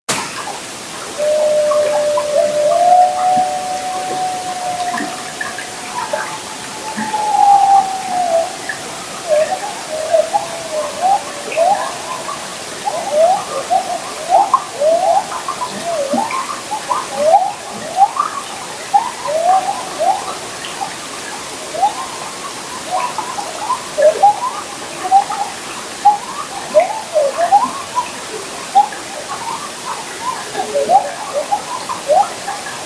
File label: mimicking coyotes